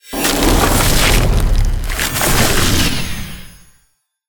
Ice Wall

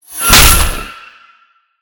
Double Slash

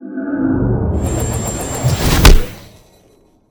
Propellor punch

